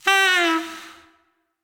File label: Saxophone Stinger; lego dimensions soundtrack